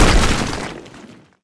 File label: Stone Destroyed, Stone Blocks; fowl